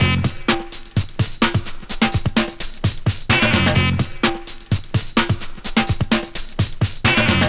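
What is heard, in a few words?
128 Pop Combo Beat F# minor; Digidesign; Pro Tools; Pro Tools Essential Loops; Pop Rock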